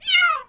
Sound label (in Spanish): maullido de gatito; tono de llamada